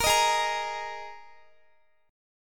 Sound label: Ab Suspended 2nd Guitar; strummed; Absus2